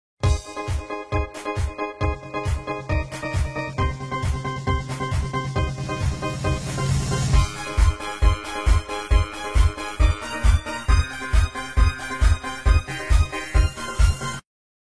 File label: motorola dance